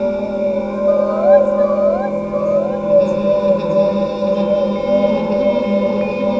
ambience

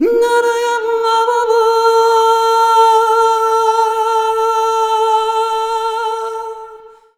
VOX, Balkanish Traditions

